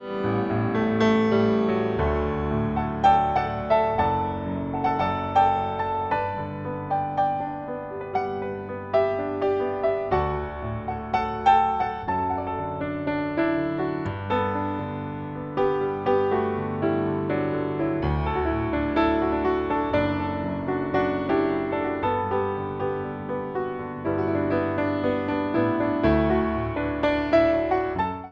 Piano

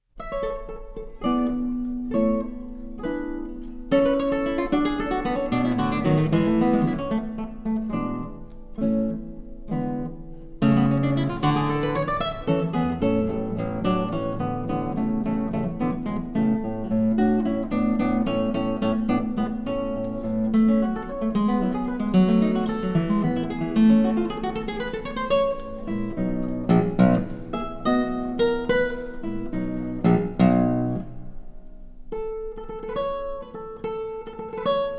Acoustic Instruments

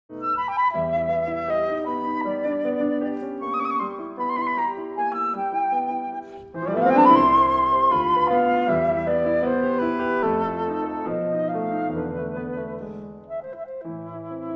Piano Accordion

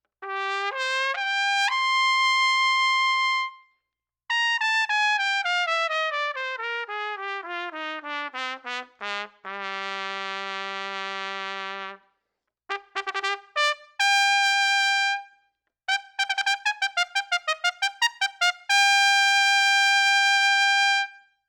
Trumpet

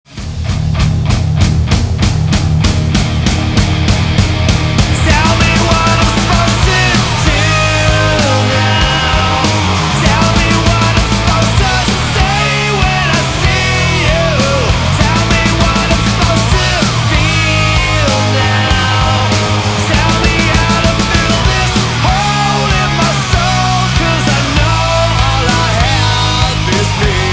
Electric Guitar Hard Rock

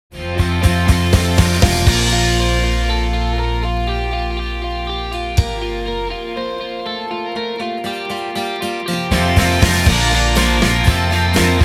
Electric Guitar Pop

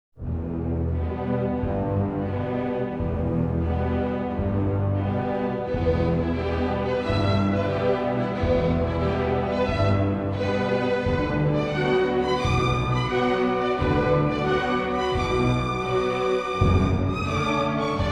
Orchestra

